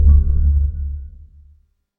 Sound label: tip transition